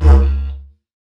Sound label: Didgeridoo Kit Samples